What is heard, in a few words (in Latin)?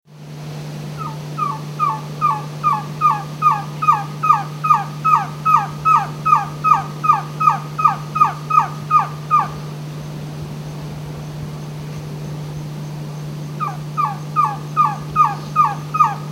Trogon viridis; R Verde EE Jureia SP; Silveira